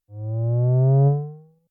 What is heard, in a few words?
sleep